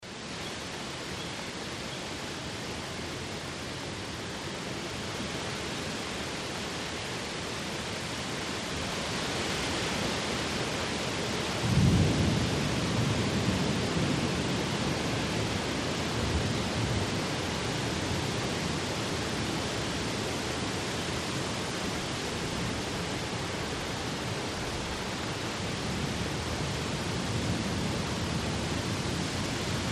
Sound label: Tropical Rain High